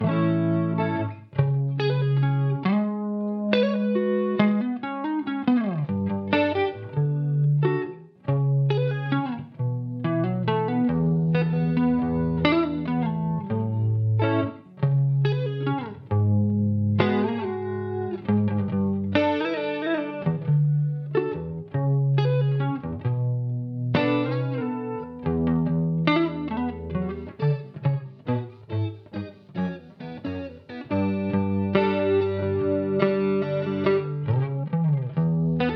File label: guitar